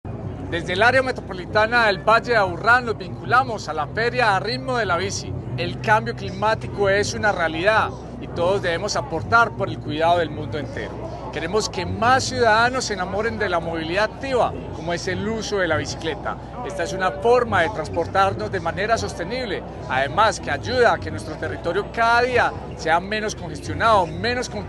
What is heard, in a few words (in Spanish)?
Ritmo de Bicicleta